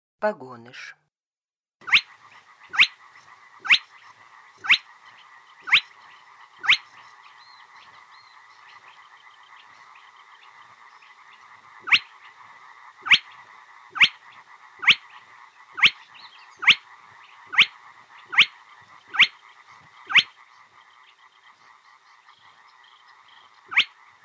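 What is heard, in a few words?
pogonysh golos, spotted crake voice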